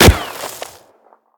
Gunshot Miss; Devour; The Town; devour 2021 gamerip pc macos

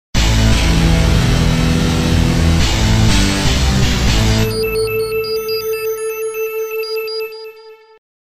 Gameover; crimzon clover

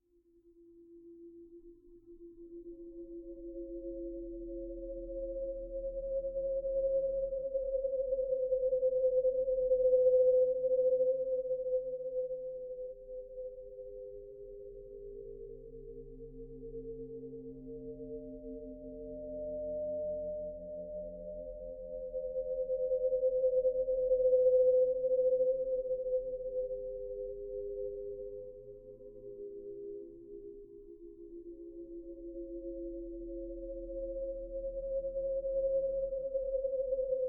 Ambient Psyco; Scourge Outbreak

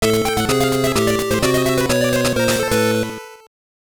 Jingle, gb; super street basketball 2; sgb

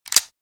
Box Unlock

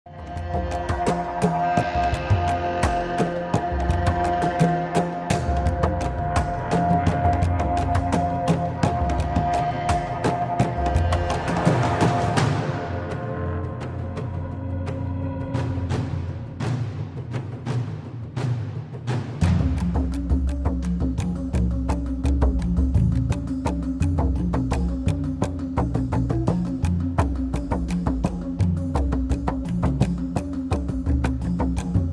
Jungle Battle Music; ARK Survival Evolved

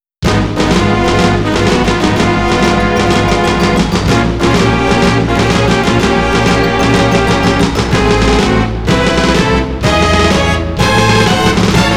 MISSION FAILED [GAME OVER] (CM-64 Arenji Baajon); Namco Sound Museum from X68000

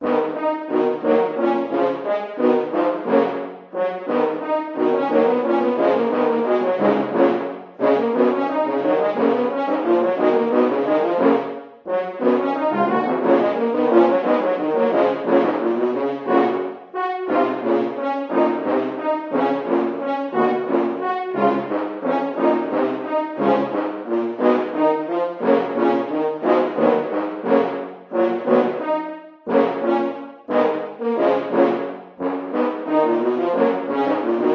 Horn

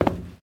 Footsteps, Human, Metal, Run, Isolated Steps; Backrooms 2D

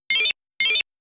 Airborne Enemy Stricken; Xevious

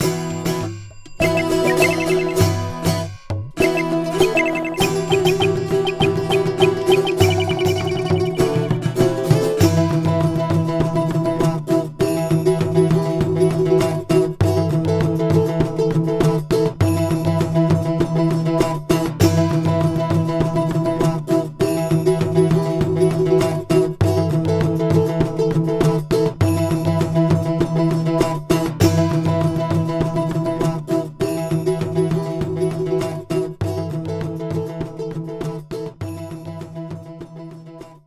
bgm pot ethnic

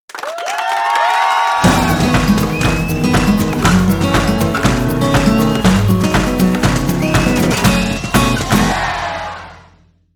Cottage Jingle; Go Vacation

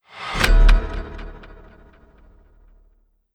mini monologue death

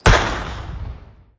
Rocket Explode; Angry Birds Seasons

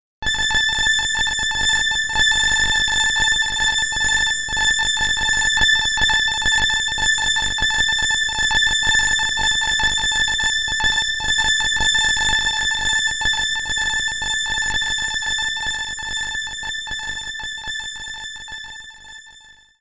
bonus round (coins); Mario Clash; virtual boy

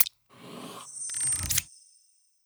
bill open

